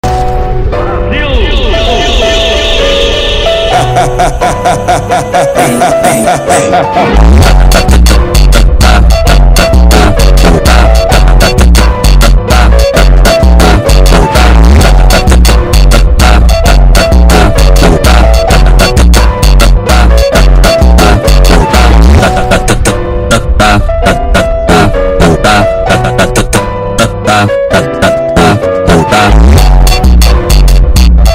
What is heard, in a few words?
phonk